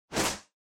Motion Agility

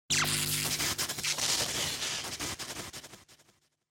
Electric Shock Long

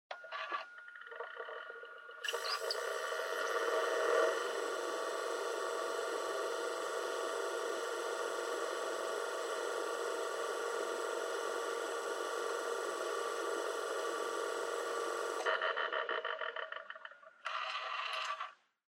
Portable Stereo Driving Noise CD Play Stop

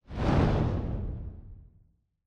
Camera Motion; カメラモーション; シーン・カメラモーション

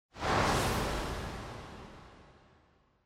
Camera Motion Reverb; シーン・カメラモーション

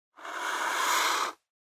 Felt Tip Pen Straight

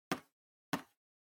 Trackpad Tap Double

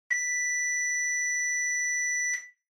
Washer Front Load Beep Long

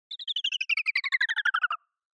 Motion Slide Down Slow

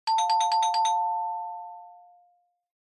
Quiz Ding Dong Mid

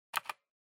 PC-Keyboard (Space-Mid)

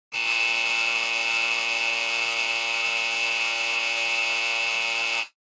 Electromagnetic Buzzer Dry Long

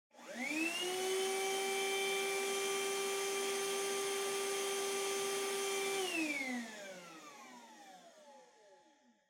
Vacuum Cleaner Far Vacuum

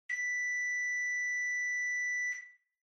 Washer Front Load Beep Far Long

